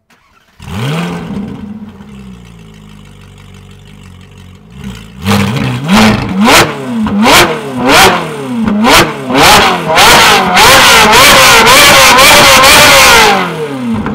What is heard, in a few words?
Exhaust Ferrari 458 Speciale, car engine